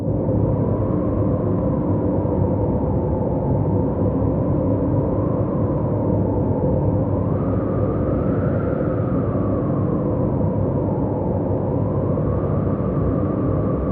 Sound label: Castle Loop